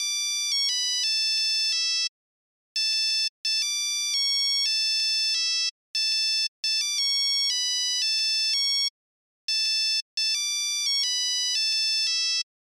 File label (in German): klingelton